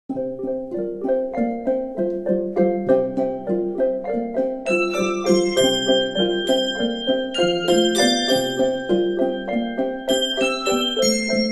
concert bell choir blessed assurance; bob jones university

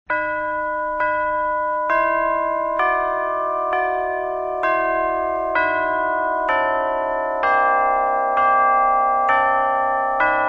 Cathedral Organ And Chimes

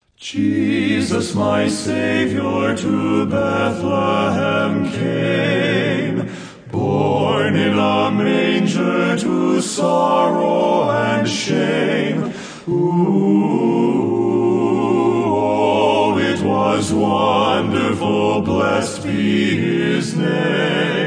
Men's Quartet

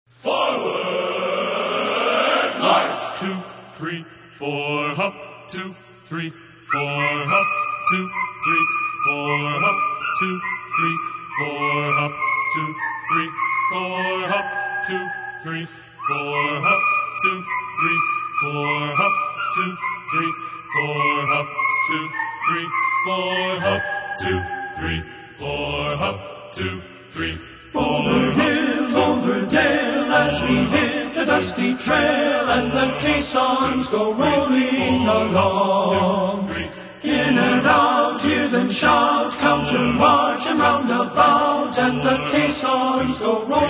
Men's Chorus

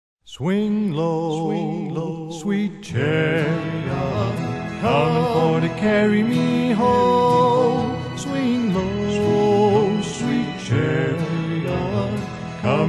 trio

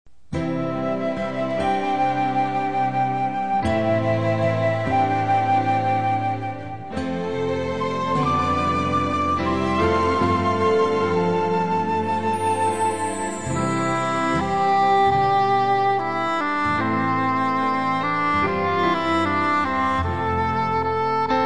Instrumental Praise

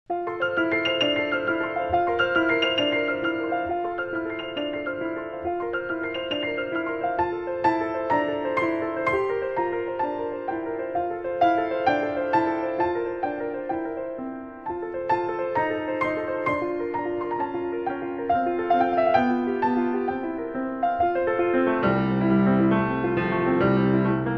Sacred Arrangements For Piano

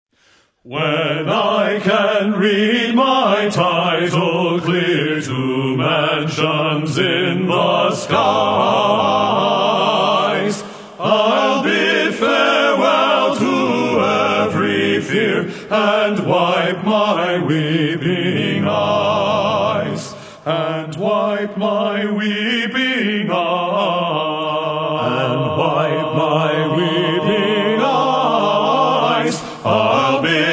Men's Quartet

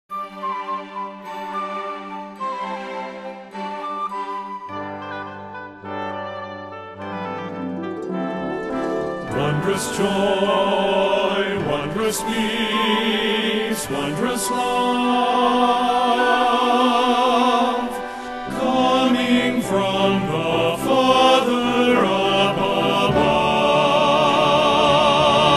men's chorus

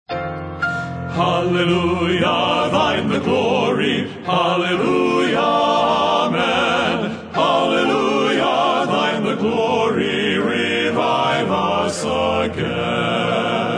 Men's Quartet

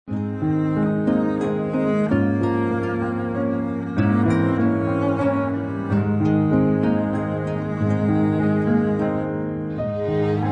Strings

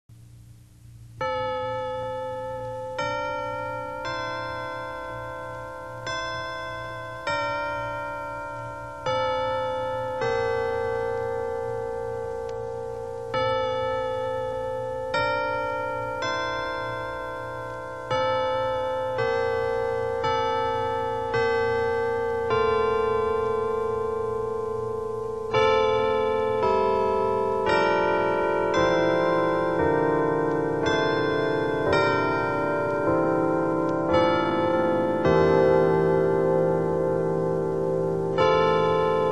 carillon chimes

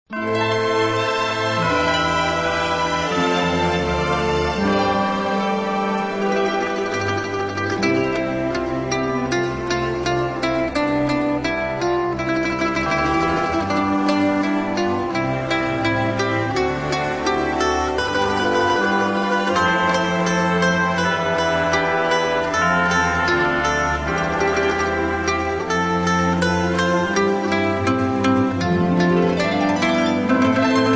Majesty Strings

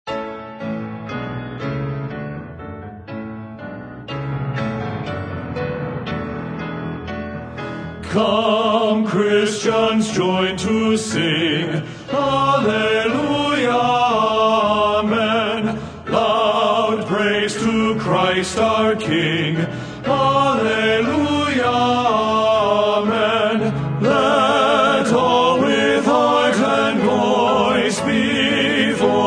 Men's Quartet

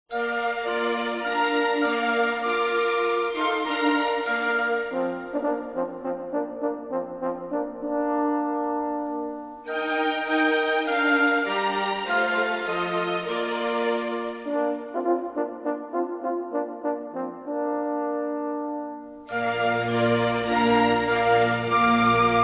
Christmas Meditations for Orchestra

